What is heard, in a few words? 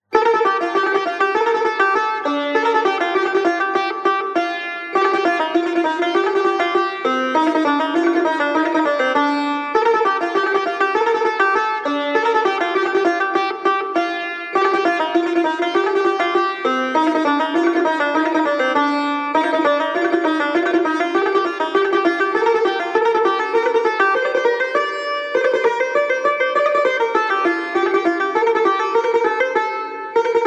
study of dashti tar; first book